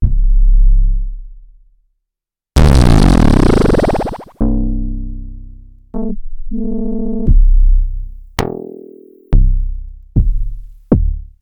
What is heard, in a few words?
Bass c